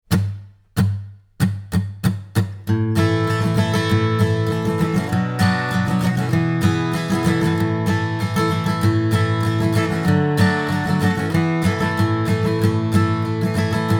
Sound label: Backing Track, banjo; banjo lesson; Masons Apron